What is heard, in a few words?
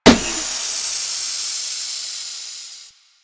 Car Tire Pop; Warframe; Ambience; Nineteen Ninety Nine